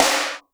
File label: snare, Roland R-70